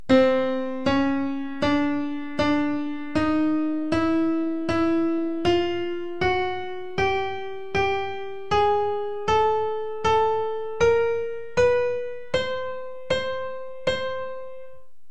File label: medieval arabic superchromatic